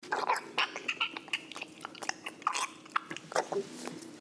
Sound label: throat, moans; The Witcher Hunt